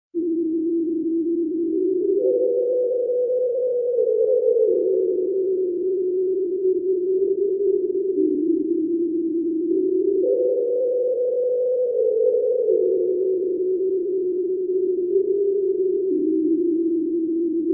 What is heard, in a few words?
instrumental